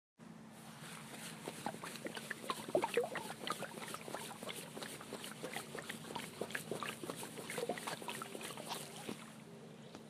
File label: Acute Acidosis Water like Sound